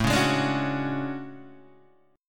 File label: A Major 13th, Guitar DADGAD